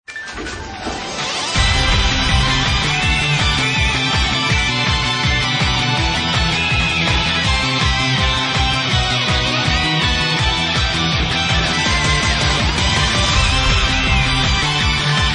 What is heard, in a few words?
BGM